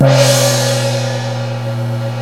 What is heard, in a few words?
ENSONIQ WING GONG